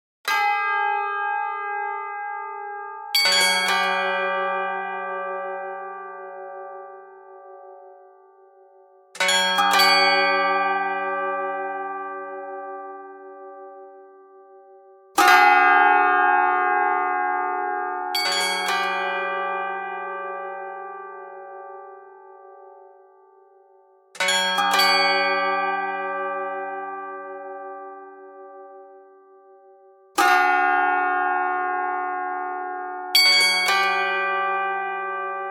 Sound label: bells sketch; weeklybeats 2012